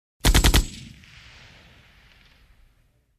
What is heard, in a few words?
Very heavy machine gun shooting short